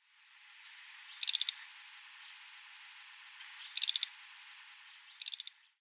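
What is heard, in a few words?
Grey-headed Lapwing, Himeji; keri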